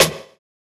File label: Snare, jungle breaks drum sounds